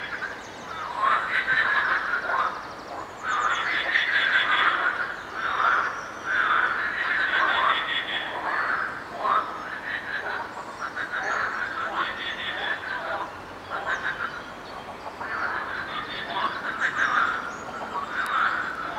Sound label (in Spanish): ranas